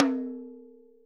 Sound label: ROTOTOMS; Sonic Reality - Vintage Timetraveler